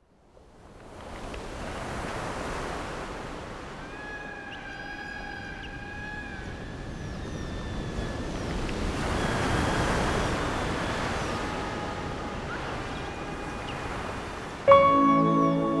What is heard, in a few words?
drums, relax